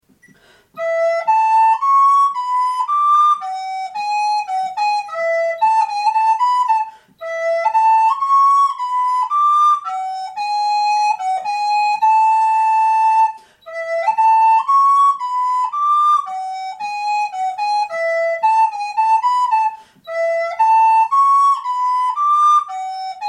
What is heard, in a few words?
menuet, FLUTE; Marin Marais